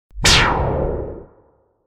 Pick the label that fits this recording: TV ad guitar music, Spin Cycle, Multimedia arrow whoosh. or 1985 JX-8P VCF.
Multimedia arrow whoosh.